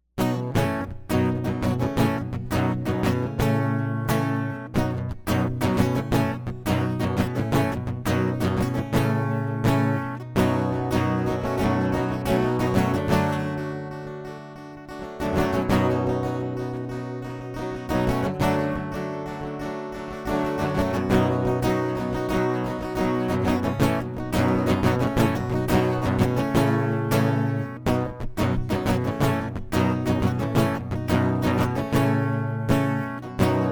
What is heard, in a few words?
acoustic